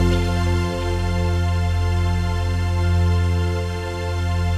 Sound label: CHRD PAD; Best Service; XX Large Pads; Sample CDs